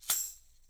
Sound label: TAMSHA, EFFECT CYMB, 3D Drums; 3D Sound